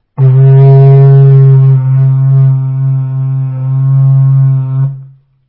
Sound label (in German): holzlure